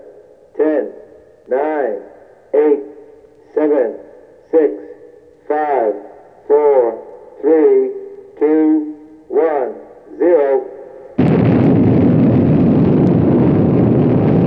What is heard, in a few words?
Blastoff; FILM QUOTES